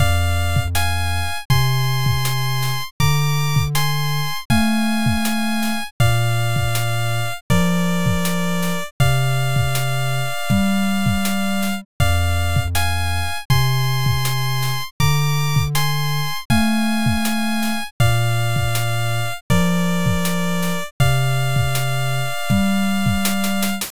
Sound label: bgm